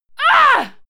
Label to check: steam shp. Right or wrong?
Wrong